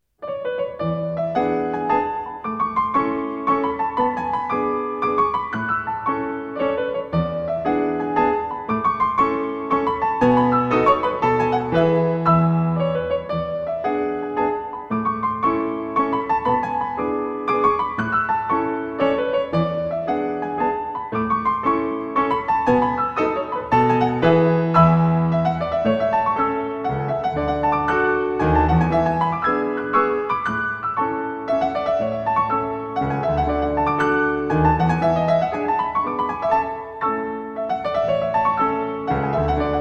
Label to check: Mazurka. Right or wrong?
Right